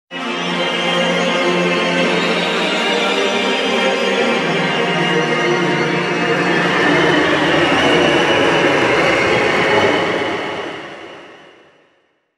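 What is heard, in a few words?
Vox Of Citizens, V-Synth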